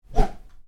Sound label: Swish small fast, Swish: Short, Fast; Whooshes; Sound Design; Stuart Duffield